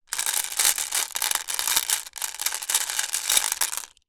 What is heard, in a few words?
foley marbles many in glass bowl movement with hand